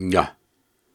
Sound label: Syllables and Imaginary Words, Viking Vocal, Hard